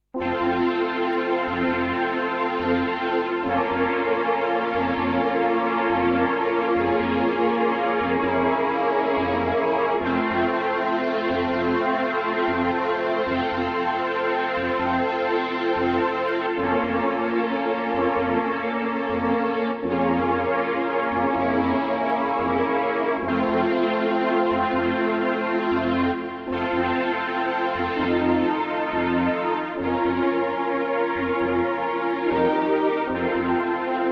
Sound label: Korg 05RW; Korg 05R/W Synthesizer module; Broadway; another sound from my Genesis patch set